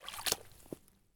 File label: swamp walk; Escape from Tarkov